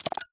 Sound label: Fully Charged